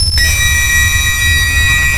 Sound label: sonar Ping Water Very Close Beacon; Barotrauma; Real Sonar